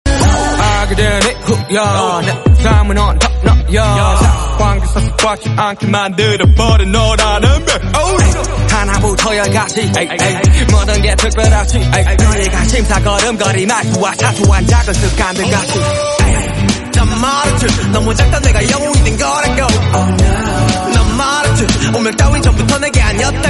Rap Korean Song

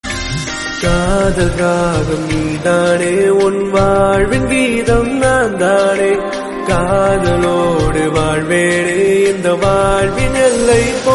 Tamil Song

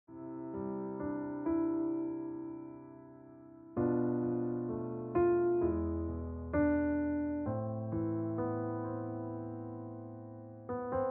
Piano Music

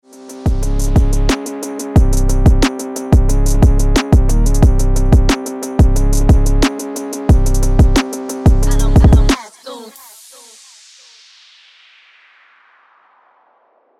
Dombyra Ringtone

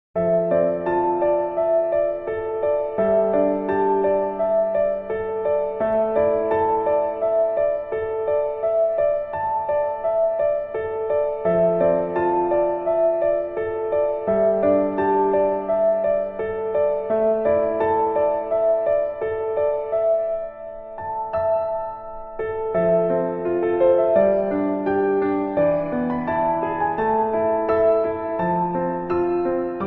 Instrumental

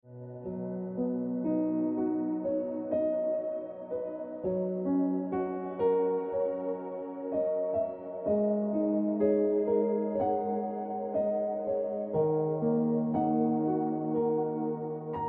Piano Music

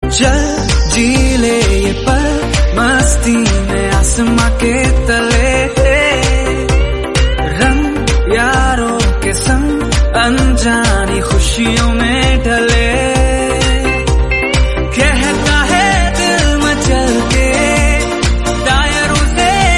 Song Hindi

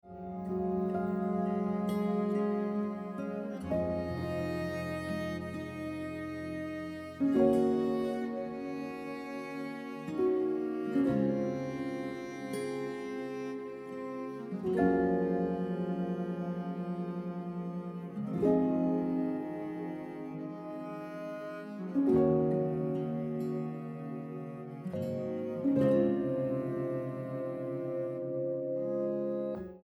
Piano Music

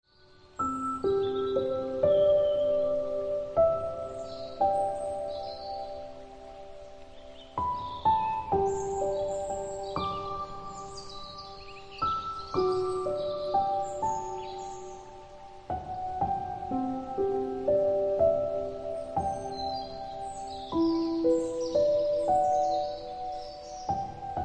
Ringtone Piano Music

